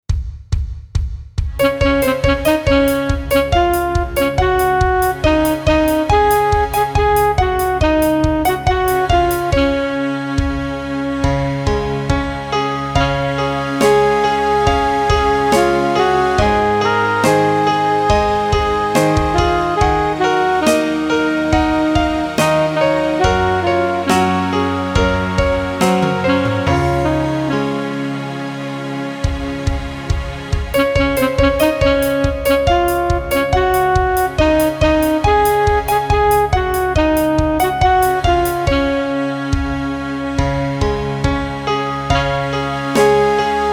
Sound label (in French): VERSION KARAOKE